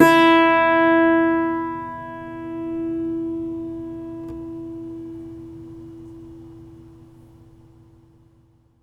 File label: Foley